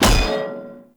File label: Shield Impact Player Flesh; Weapon Impacts; Enemy; Shields; Sinecure Shield